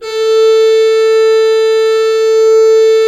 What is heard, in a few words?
Cathedral Organ, HOLZGEDACKT, A3; Propeller Island